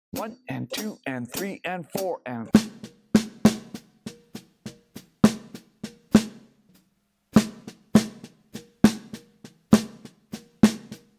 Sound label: Melody Straight 8ths